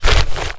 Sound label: paper sheets many crumpled compress movement; foley; zapsplat